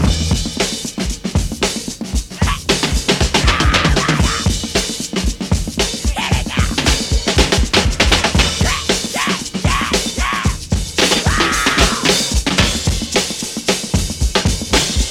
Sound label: 115 bpm breakbeat e key, Free breakbeat sample - kick tuned to the E note. Loudest frequency: 2416Hz